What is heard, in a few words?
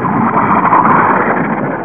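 arty incoming; TacOps Demo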